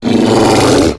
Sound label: Attack